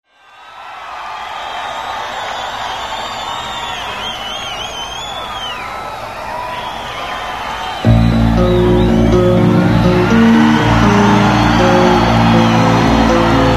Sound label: Bass Solo